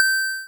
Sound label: correct Answer; yumpod